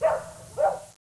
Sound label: dog; Close Combat